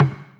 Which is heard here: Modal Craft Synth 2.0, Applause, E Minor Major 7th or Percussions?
Percussions